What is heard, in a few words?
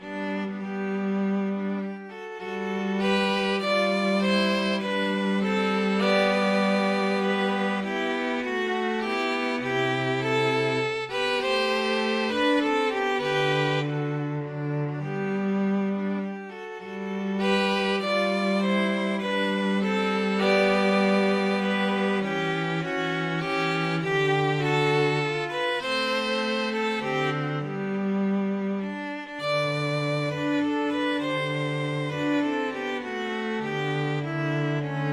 string trio